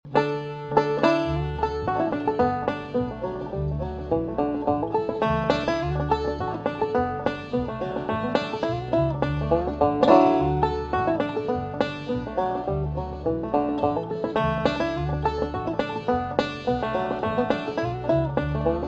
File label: hornpipe, banjo